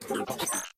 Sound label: Ghost Chirp